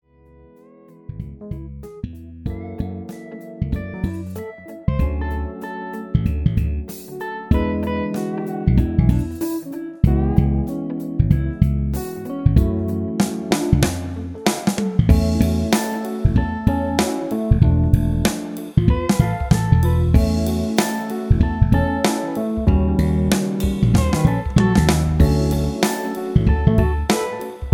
easy listening bandstand

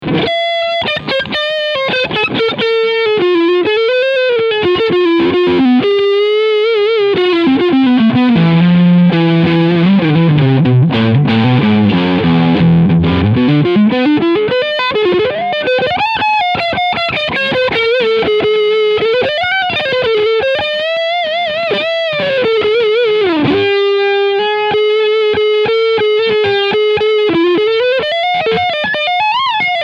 High Gain Neck PU

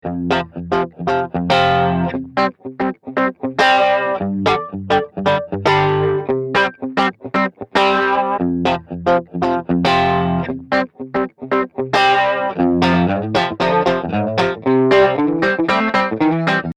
Git pan

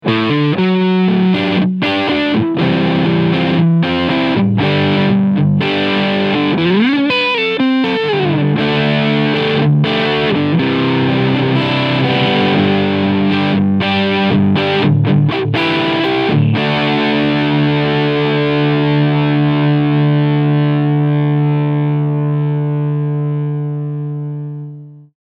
TE 53KR BL Tribute Serie beide PUs Overdrive; Harley Benton

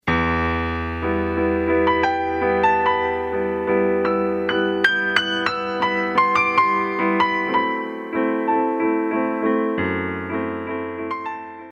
Kurzweil artis

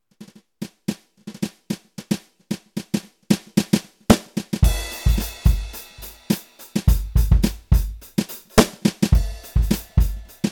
Millenium MPS 1000 Jazz Brush Kit

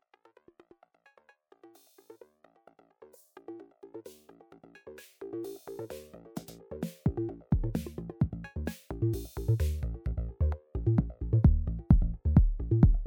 MinimalBrute max

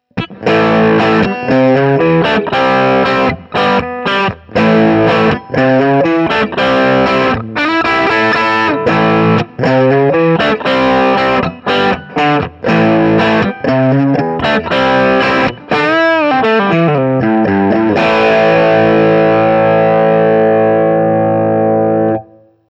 Gretsch ETMC LTD Pristine JET Rhythm Drive

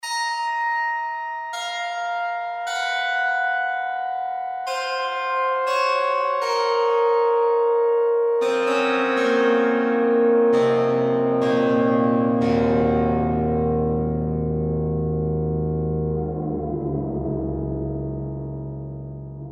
SX240 RING FX

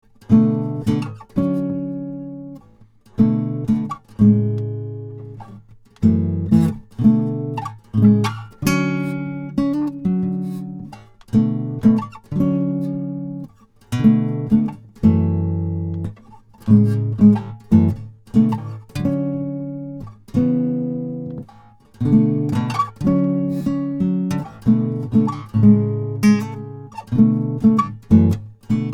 upper chords Mic Pickup

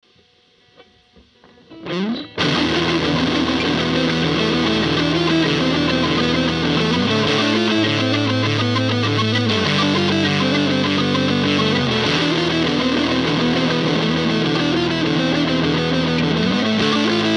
Sterling gain

